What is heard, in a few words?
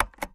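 computer spacebar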